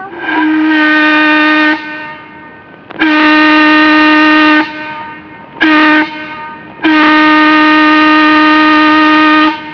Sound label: organ, steam